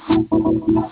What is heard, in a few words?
tekkno eq